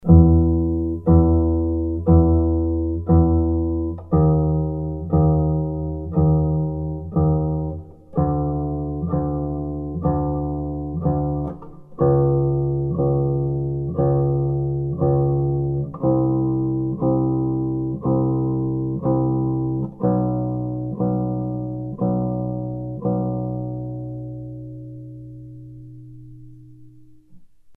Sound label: before scale, contrabass